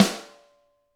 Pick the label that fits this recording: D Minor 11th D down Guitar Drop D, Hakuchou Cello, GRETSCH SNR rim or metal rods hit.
GRETSCH SNR rim